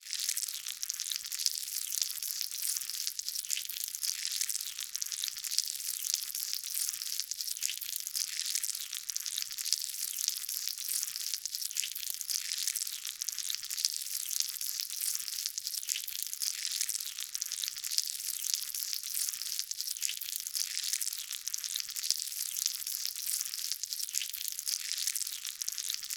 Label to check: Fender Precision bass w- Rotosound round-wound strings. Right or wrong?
Wrong